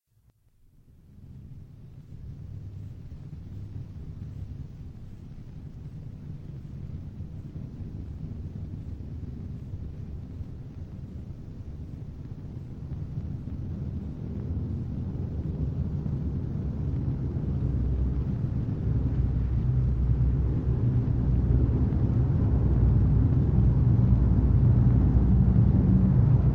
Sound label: Complete raid, Air Raid; Children of the Blitz